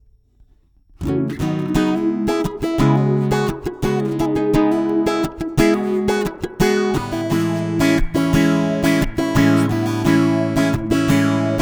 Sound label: Auto Wah Spring Reverb Jumbo